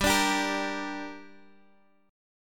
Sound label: G Minor, Guitar Standard; strummed; x,x,5,7,8,6; JGuitar